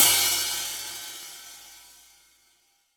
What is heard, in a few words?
Amb Rm Kit, Akai MPC-500